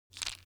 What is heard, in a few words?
object stone gravel clatter soft; smartsoundfx